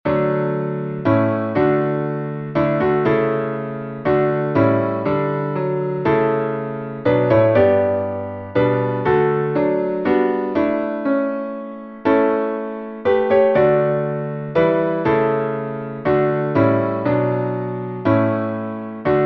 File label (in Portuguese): salmo instrumental